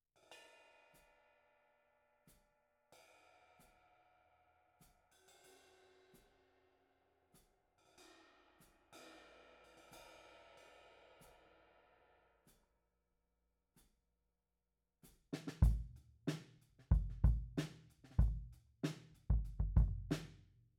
Kick Out, 94bpm

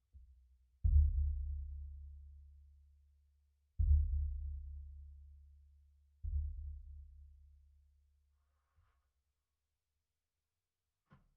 Sub

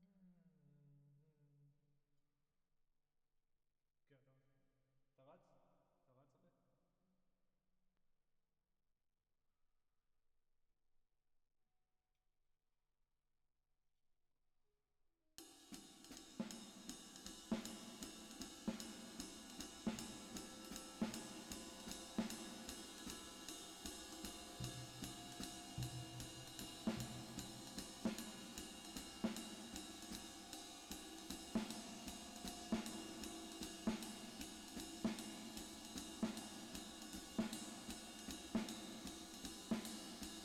Drums Verb

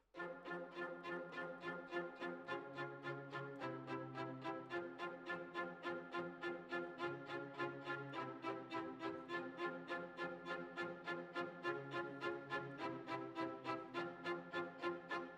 Violins, jazz for piano

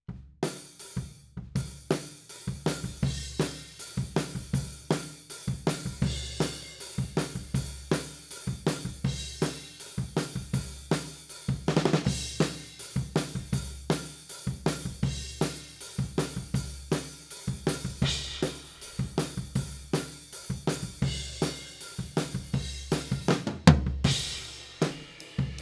Floor, 160 BPM

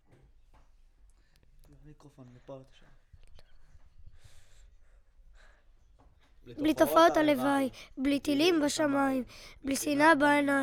VOC

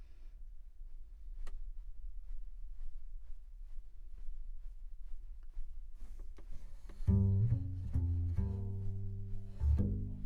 Bass Bot

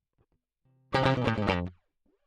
Guit Solo Line, RNR for piano

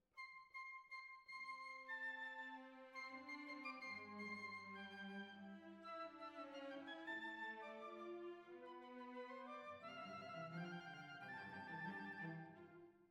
Violins Over

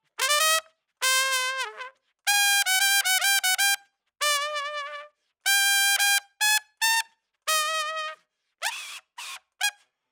Trump; RE20

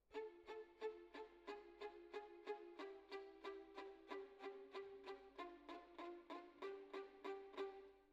Violin Solo

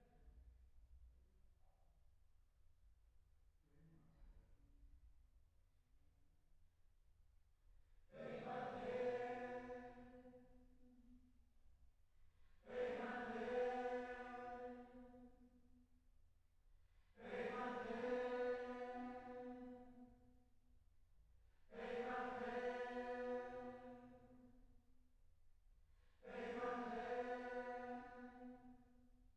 Choir

